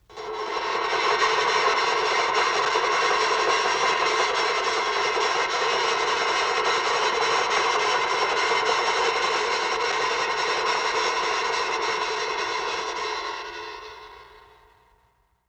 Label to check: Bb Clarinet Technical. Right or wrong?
Wrong